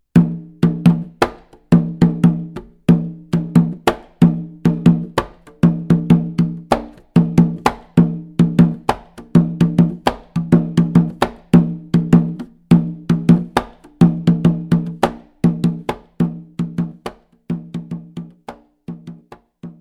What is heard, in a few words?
Atabaque